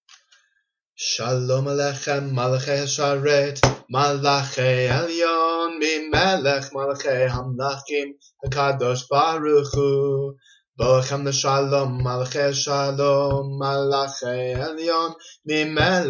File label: Shalom Aleichem upbeat